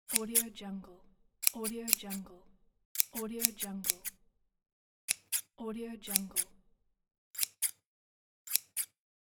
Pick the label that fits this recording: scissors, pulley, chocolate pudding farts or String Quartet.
scissors